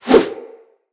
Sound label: Melee Idle Whoosh; Weapons